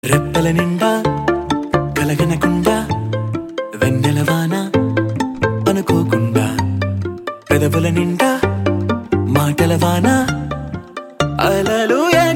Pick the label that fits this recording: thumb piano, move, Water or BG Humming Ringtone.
BG Humming Ringtone